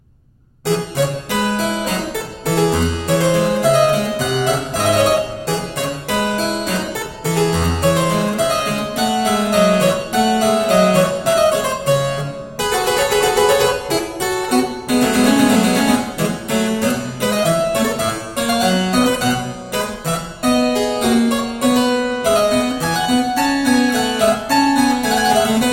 Ouverture Burlesque Gavotte en Rondeau, Harpsichord; Georg Philip Telemann; Der Getreue Music Meister